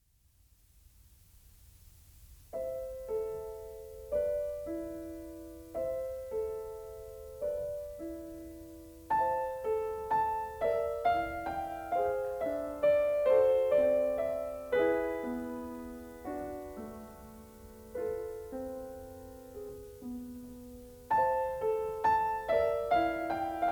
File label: Piano, Waltz; Piano Playtime